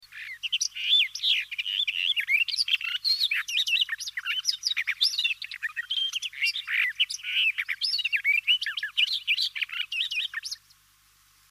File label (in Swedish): Hoksangare; Barred Warbler; Sylvia nisoria; Patrik Åberg